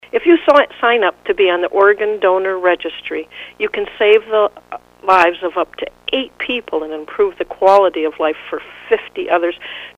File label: ORGAN